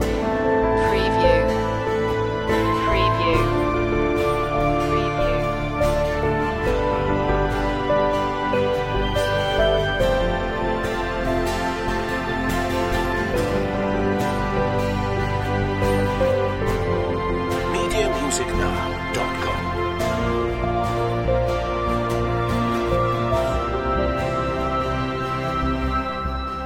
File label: hope loop